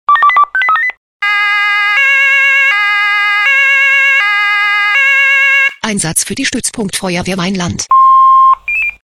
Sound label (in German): SMS Alarmierungstone; FW Weinland